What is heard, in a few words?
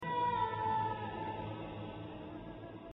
midi chinese ghost story